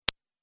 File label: Idea Single Pop, cartoon; Cartoon Sound Design Sound Effects